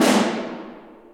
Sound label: Snare; Torchlight III; PS4